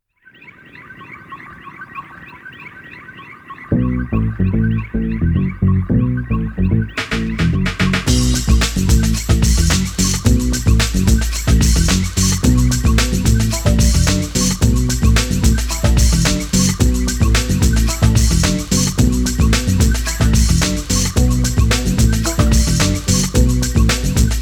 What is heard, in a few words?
love instrumental